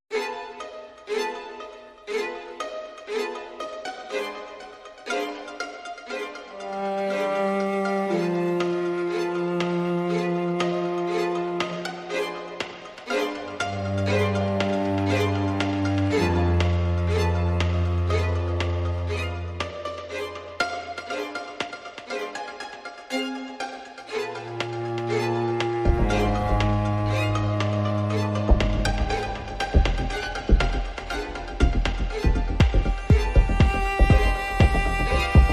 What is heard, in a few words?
Aggro Strings, Full